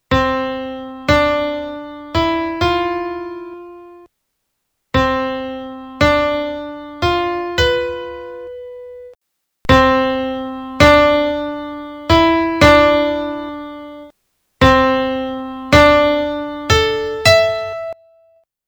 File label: complex melody